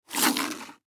Lens Cleaner Slide, Lens Cleaner Slide Plastic Bottle; Cleaning; Household; Foley; Blastwave